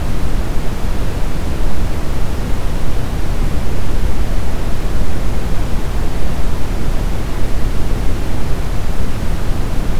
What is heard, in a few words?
Brown Noise Mono